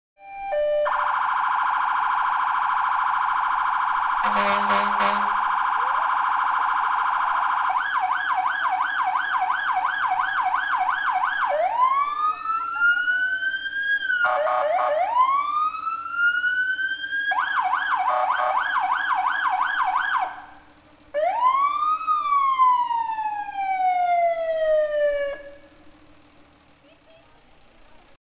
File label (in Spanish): Sirena carro bomba; pichidegua; mapa sonoro